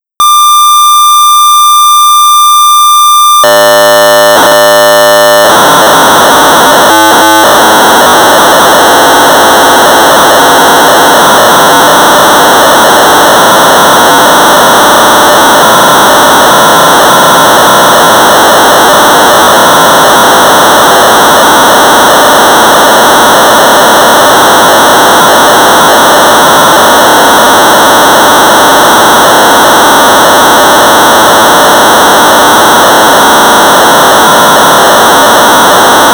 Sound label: BASIC Sorcerer 1200baud; Bio-rhythm; Micro Software; Sorcerer Users (Syndey) Group